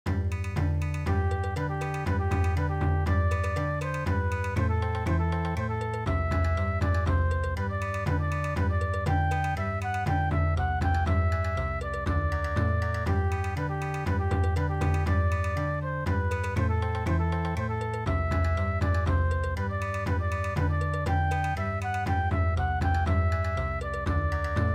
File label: Bon Odori, traditional; midi